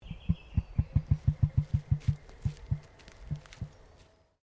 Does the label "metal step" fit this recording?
No